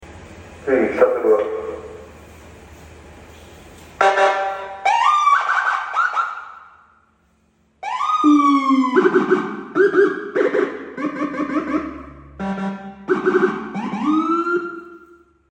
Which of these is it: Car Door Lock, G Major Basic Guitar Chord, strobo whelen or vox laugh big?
strobo whelen